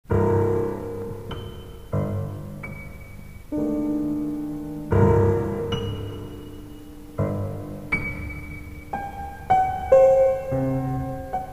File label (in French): pour piano